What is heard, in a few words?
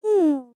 Panting; Neru; SM64 CO-OP; Triple Baka Pack